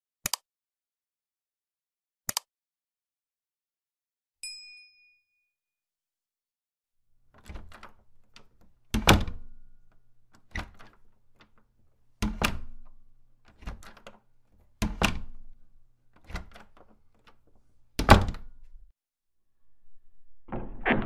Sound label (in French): Bruit de porte en bois